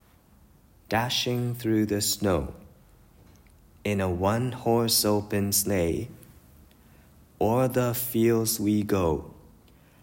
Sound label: jingle bells